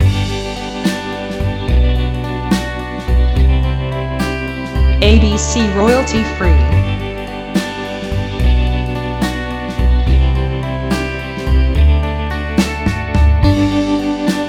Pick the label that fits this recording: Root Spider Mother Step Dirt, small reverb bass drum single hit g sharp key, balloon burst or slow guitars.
slow guitars